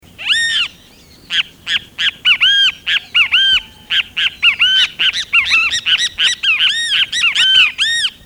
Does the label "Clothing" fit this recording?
No